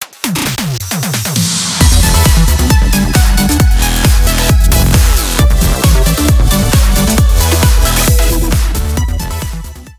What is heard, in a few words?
BGM THUMB HOUSE; Busou Shinki Armored Princess Battle Conductor; arcade